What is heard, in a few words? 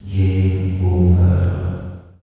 gameover; glaxium